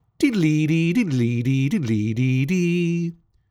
Classic ringtone; Foley; Judge John Hodgman

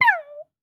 Laser gun; Let's Learn Everything!

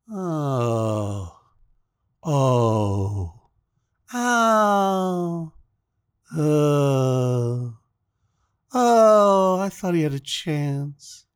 Disappointed crowd, staggered; Judge John Hodgman

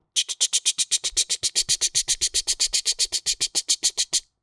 Ticking clock; Foley; Judge John Hodgman

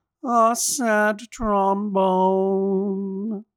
Trombone, sad; Foley; Judge John Hodgman

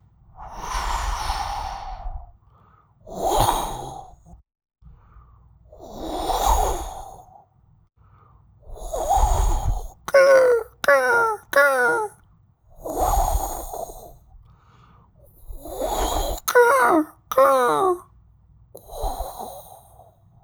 Calming ocean sounds; Judge John Hodgman

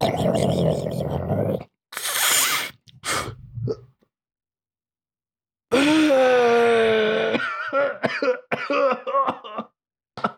Bong rip, huge; Foley Library; People Sounds; Let's Learn Everything!

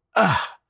Efforts, People Sounds; Foley Library; Judge John Hodgman

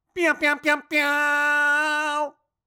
Airhorn; Foley; Judge John Hodgman

